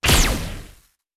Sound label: Lightning